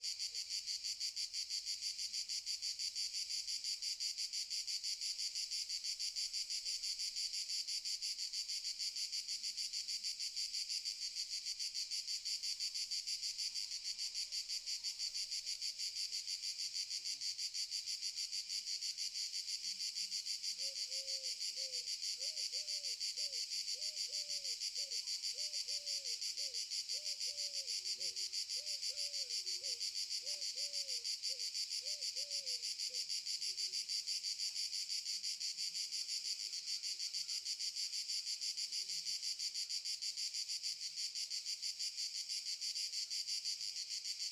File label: Summer in the fields DAY Morning the cicadas stridulate very loudly cowbells; Crickets and Cicadas; SculpTunes